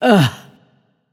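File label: ugh, female; smite; voicepack; hera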